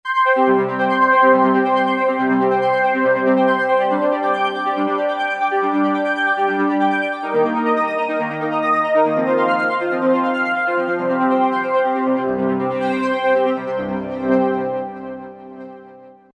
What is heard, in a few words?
Roland V-Synth; Factory Patches; 124 Prelude